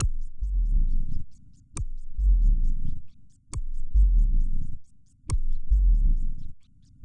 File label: Synth Bass; Bass X